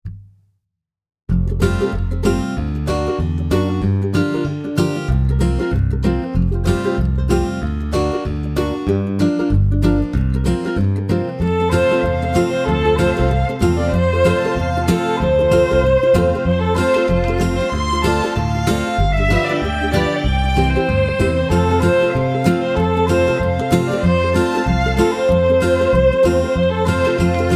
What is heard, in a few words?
A Surfers Dream (Pure Tone)